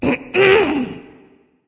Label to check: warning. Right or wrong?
Wrong